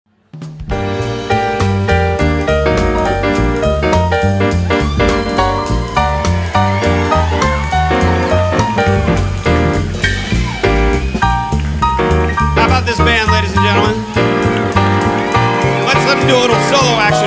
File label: nc solo long